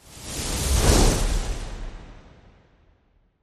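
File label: sun disk disappear; aztec gold; Aztec Gold Megaways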